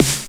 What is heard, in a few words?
Snare; Takebeat; Club; VEC1